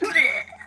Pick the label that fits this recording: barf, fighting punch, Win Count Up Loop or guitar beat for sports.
barf